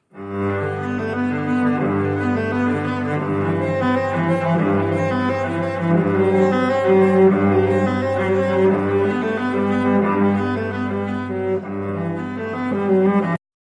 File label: Bach Cello Suite